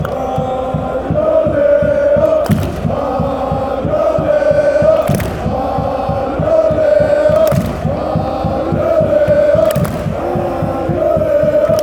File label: chant